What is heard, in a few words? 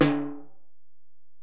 Bell, Alesis DM-PRO